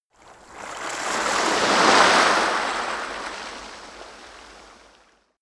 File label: coast oneshot surf soft